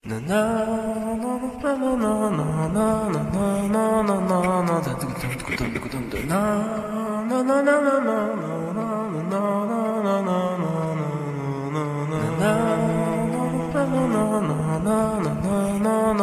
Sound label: Load Loop; korogashi puzzle katamari damacy dsiware 2009 nds gamerip